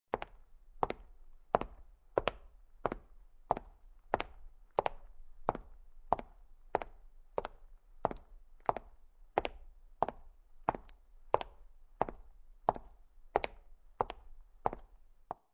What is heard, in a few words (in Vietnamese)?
Tieng buoc chan di